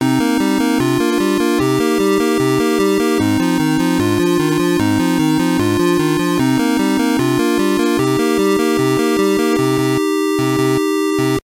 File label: Sword; Taboo